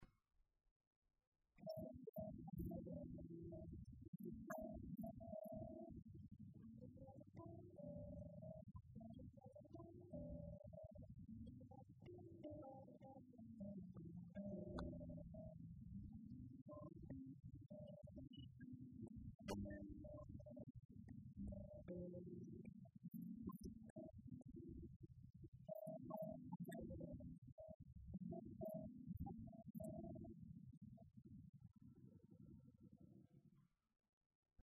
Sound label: Primera Solea